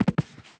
Body Falling; Zomboid; Lifestyle

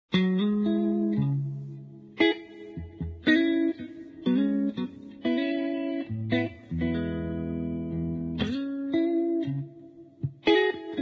Guitar Electric; Zomboid; Lifestyle

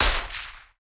MK2 shot; Zomboid; VFE

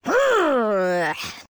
Woman Frustrated; voice; Zomboid; Lifestyle

